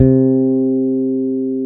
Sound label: FEND P-BASS C3